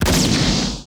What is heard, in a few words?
GSHOT, LA GUNSHO; Total Control - Action Cutz